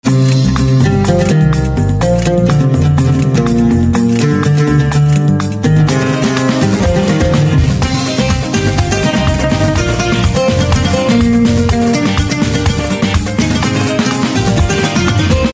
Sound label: Guitar Instrumental Ringtone